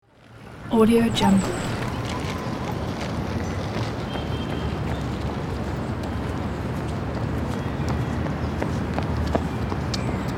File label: city park